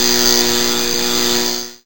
light switch

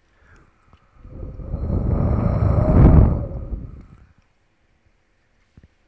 WIND

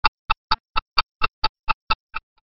ticking clock

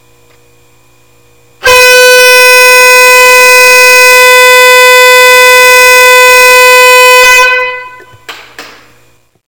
Airhorn

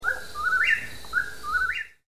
whippoorwill

